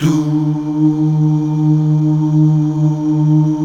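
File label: Male Duh; Best Service Gigapack 1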